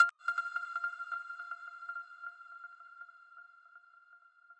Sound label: arp